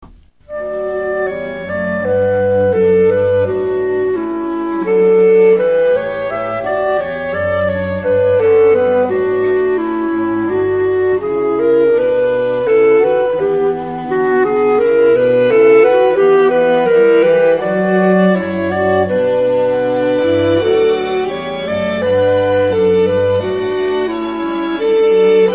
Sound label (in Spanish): clarinete